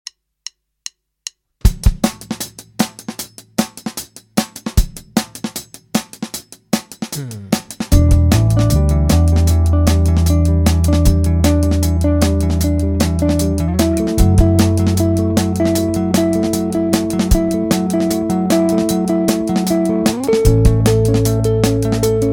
Arpeggi

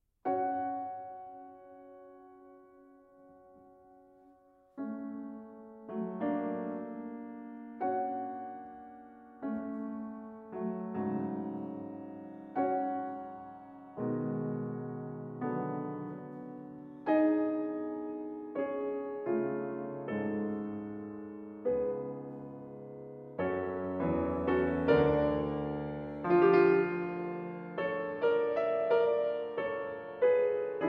Concert Studies in F-Sharp Minor

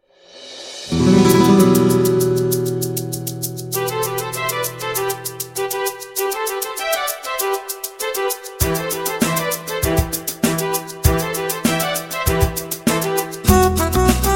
Piano

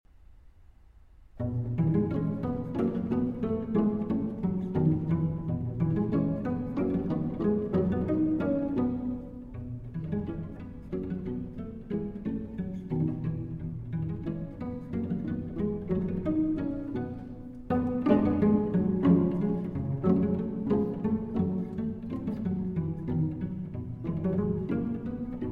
Quartet in E Major II. Minuetto. Allegretto

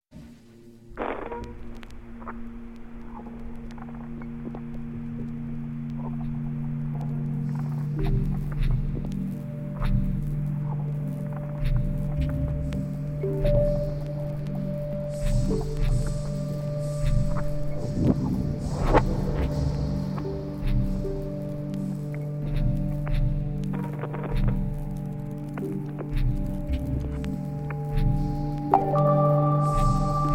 Instrumental Version

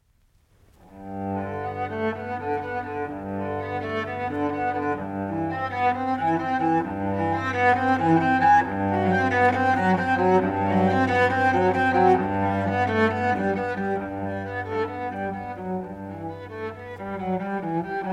Cello Suite in G Major, Prélude; Bach, JS; No. 1; BWV 1007 I.

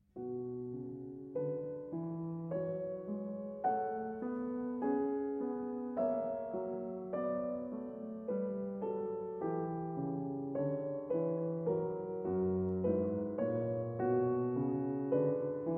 Prelude in B Minor; Prelude & Fugue in B Minor, BWV 869

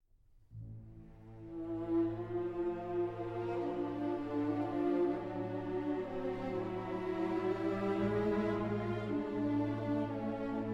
Viola Concerto Andante comodo; 1962 Version

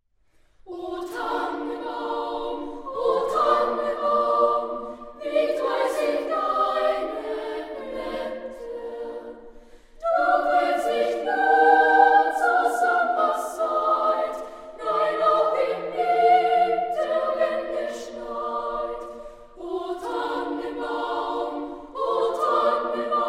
Choir - O Tannenbaum

